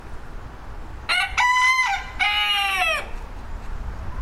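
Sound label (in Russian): петух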